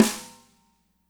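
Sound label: snare left; Spectrasonics; Burning Grooves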